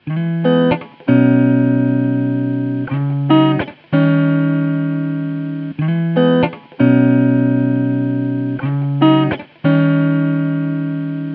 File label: 84.5bpm processed